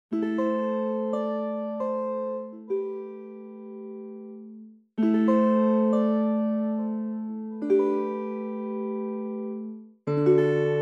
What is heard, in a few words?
LADY DULCIMER